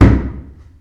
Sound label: KICK